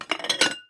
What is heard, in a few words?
Glass Remove from Cupboard, Kitchen